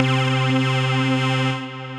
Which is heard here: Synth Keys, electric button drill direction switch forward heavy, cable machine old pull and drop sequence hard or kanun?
Synth Keys